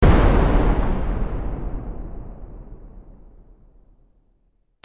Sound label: cannon